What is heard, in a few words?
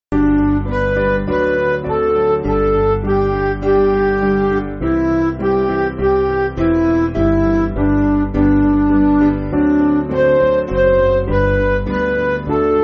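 Midi, Music Backing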